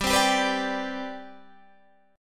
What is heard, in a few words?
C Major 7th Suspended 2nd, G, chord